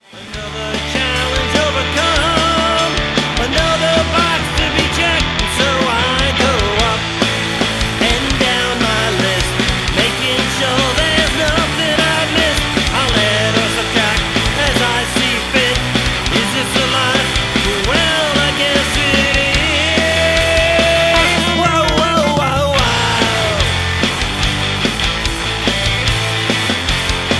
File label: Ringtone